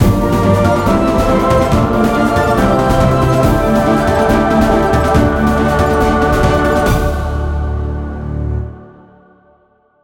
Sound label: winning; opensurge